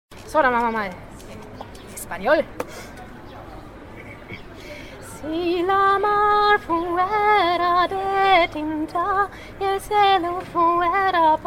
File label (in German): Lied auf spanisch